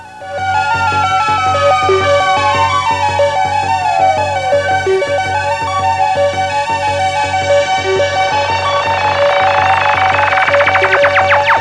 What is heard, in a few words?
hi tech; Sound Library 2000; Wayzata Technology; Music In